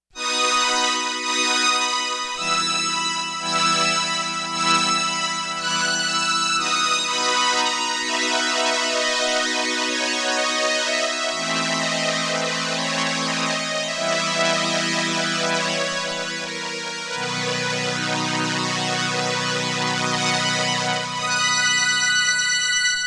STRINGS II with slow attack, sustain, vibrato and Ensemble I, Roland RS-202